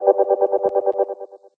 whoosh longer chopper

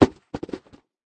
falling oranges

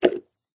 plop hard

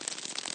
beetle wings short

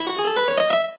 piano scale

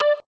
tone

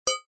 plink

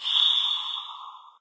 whoosh long

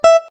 note beepy

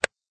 click on wood

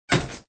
clack

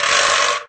machine

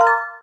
notes sequence

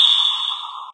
whoosh down

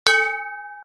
bell

